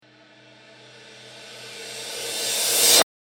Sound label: cymbal rev